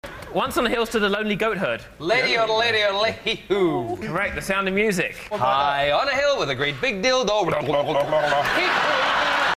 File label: yodel